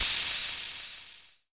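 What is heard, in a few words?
percussion spray, Analog Drums; Roland - Rhythm Section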